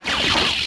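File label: destroyer droid shield on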